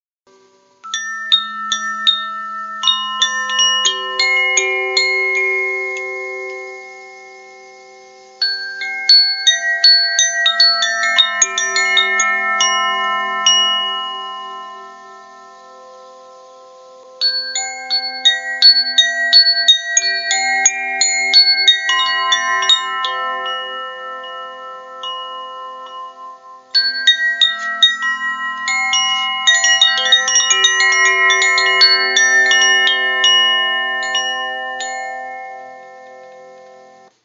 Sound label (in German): Terra Klangprobe